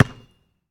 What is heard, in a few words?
Street Ball Bounce Asphalt; SFX Kit